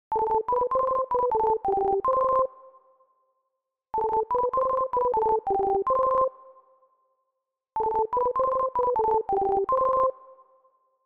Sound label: Phone Call Instrumental